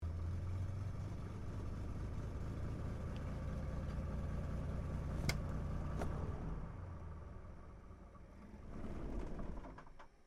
AIRCRAFT CT4A SHUTDOWN INTERIOR, RAAF CT4 training aircraft; Airplanes; Vehicles